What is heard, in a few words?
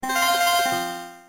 Notifier Spell Ringtone